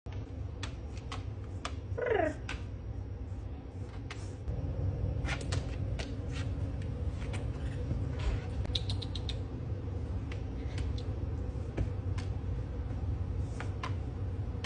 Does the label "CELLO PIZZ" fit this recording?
No